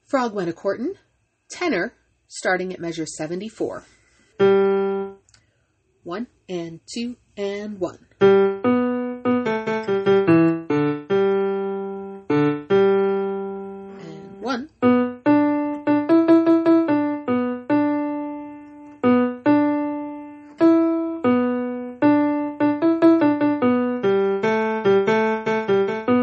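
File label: tenor; study tracks; frog courtin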